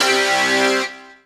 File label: dance chord